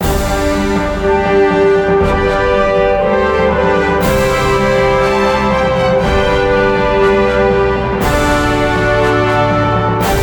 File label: Player Win; rise of nations rise of legends 2006